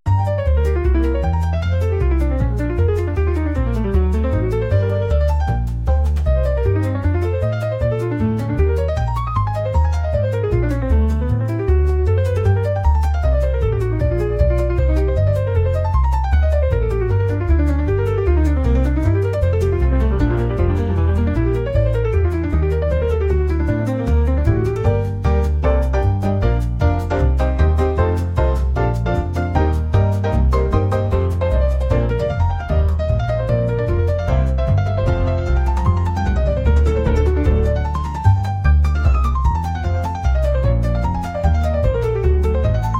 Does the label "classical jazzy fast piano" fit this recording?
Yes